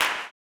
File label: CLAP, Blues Soul; Spectrasonics Vocal Planet